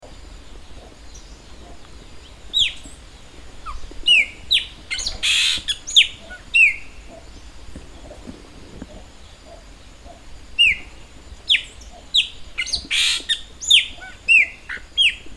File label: Gnorimopsar chopi Pássaro preto